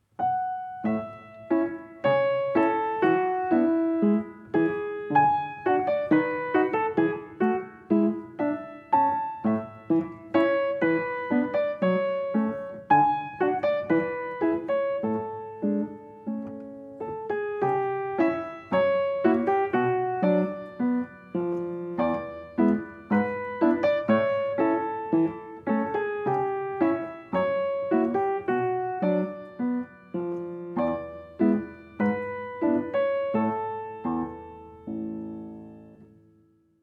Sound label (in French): OUVERTURE PIANO CLOSE